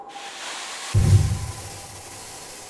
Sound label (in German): Schlag aufladen; hollow knight